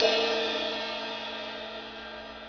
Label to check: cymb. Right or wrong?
Right